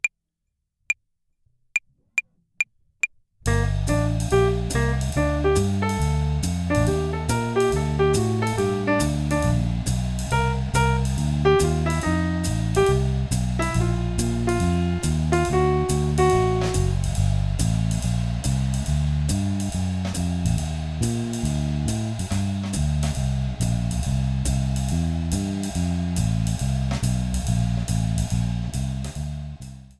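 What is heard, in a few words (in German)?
Swing Phrasierung Übung; play along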